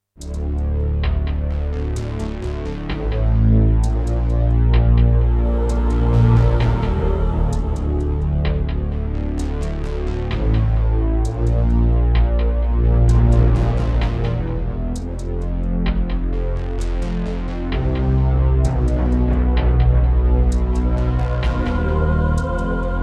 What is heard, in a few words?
Roland SC-88 55MAP; Descent II